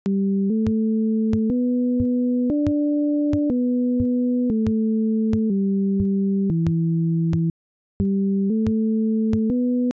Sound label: menu theme; bgm; heros